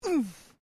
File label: FEMALE GRUNT; Female vocal sounds; Grunts (Sound Effects:Voice)